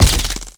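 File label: attack cam click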